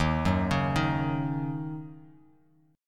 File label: D sharp Minor 7th